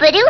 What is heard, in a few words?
bedoo; ST-XX Amiga Soundtracker Sample Packs